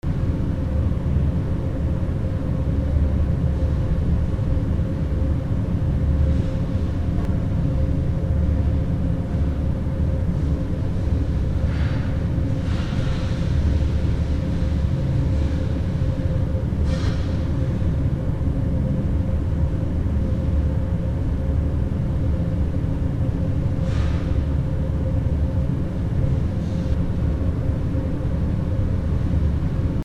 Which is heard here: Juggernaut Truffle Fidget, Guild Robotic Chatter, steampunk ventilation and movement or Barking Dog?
steampunk ventilation and movement